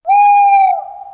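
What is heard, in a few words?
visokij protyazhnij zvuk sovi, high drawn-out owl sound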